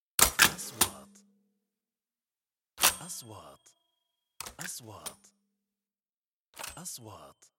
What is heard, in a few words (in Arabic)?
نغمة كاشير, transitions